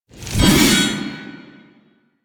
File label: Backstab Impact; Warframe; Warframes; Pax Duviricus